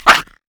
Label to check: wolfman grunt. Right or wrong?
Right